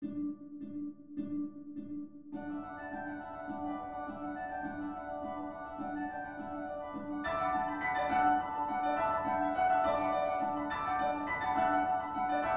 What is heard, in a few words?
Moto Perpetuo, Oboe, Cello, Piano